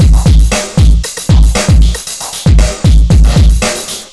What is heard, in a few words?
bass